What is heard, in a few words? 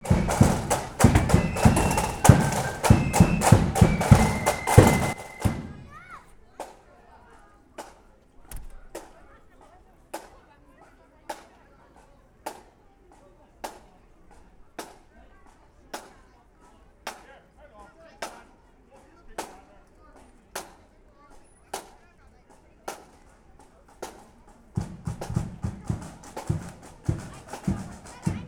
Orange Flute Band; Belfast; Sound Scapes